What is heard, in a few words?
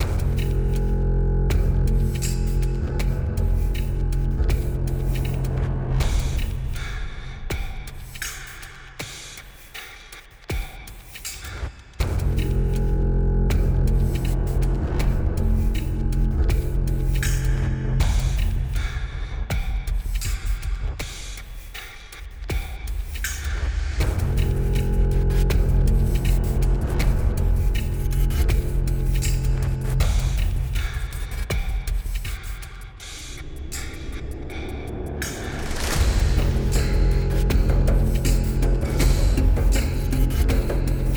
Combat Breaths Loop; Tainted Grail